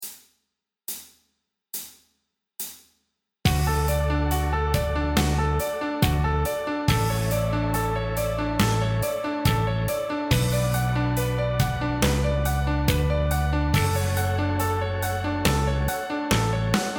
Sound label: Backing Track Slow